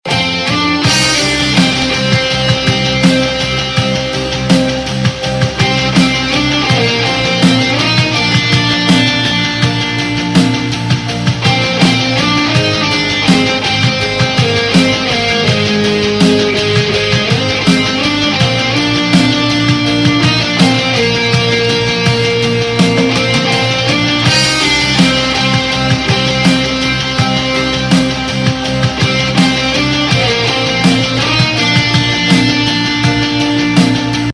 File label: Instrumental Ringtone